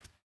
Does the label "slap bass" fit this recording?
No